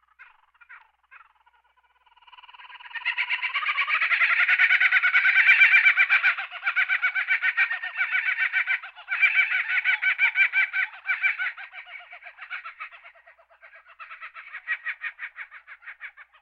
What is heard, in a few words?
Birds